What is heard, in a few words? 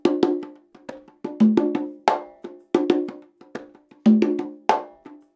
CONGA, Percussion Loops; USB Soundscan vol.36; AKAI; 90CONGAS